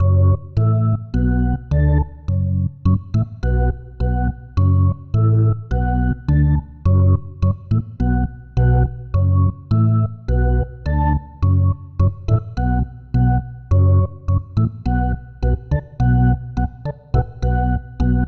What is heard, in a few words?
Organ; Sonic Foundry (Sony Creative Software); Indie Rock Anthems